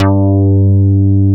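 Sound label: POCKET BASS G3; POCKET BS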